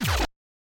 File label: fast fly by; soundscrate